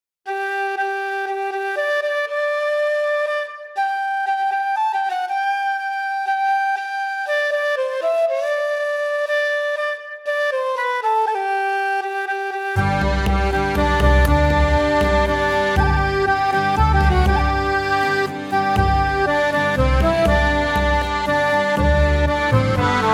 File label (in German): Shakespeare Musik